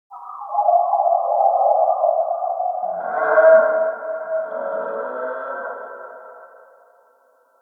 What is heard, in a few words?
Signature Call; Ambience; Corrupted Ode Three